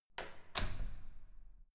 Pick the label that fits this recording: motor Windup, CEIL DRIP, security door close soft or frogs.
security door close soft